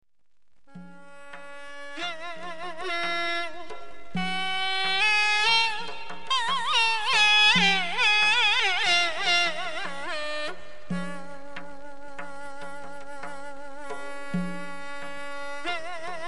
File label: QO`SHANY